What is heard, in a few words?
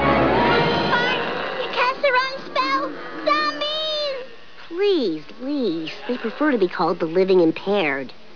ZOMBIES